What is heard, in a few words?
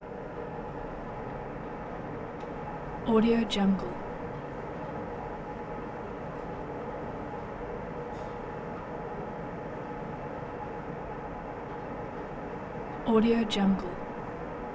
ventilator airy blower light rustle